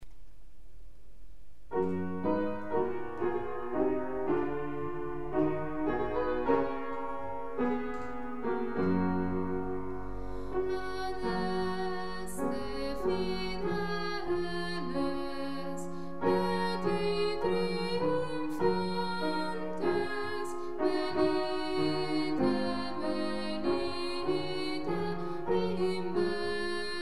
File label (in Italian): adeste soprani